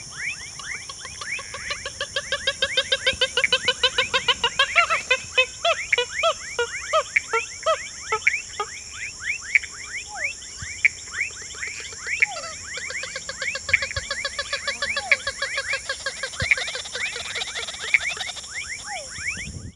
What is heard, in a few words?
porphyrio martinicus